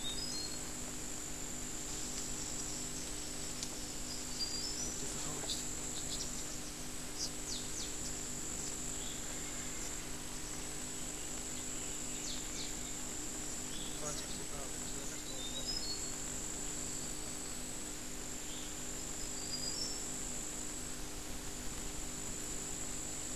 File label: Mountain Imperial-pigeon; List of national symbols of Bangladesh